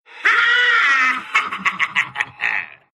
Raz laugh, ru

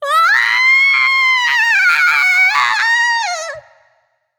Falling; paladins; Maeve

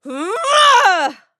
Mage Grunt Physical; Radon Flux; Realm Royale

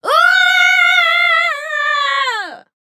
Falling; ChurchHill; Paladins

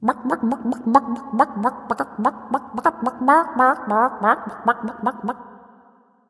Laugh; Isis; Smite

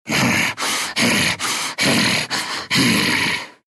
breathing, ru; dota2

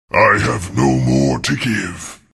husk death; huskar

